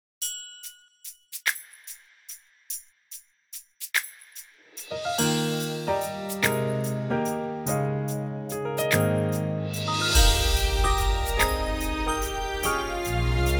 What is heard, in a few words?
Drumless; loop clicktrack